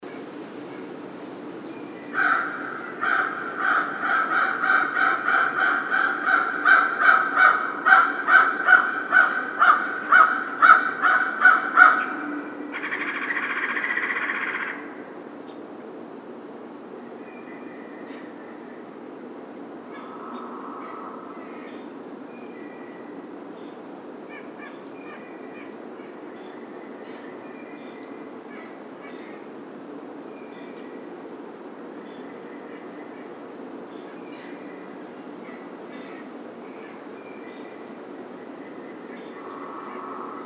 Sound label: American Crow